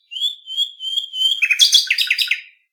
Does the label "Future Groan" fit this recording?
No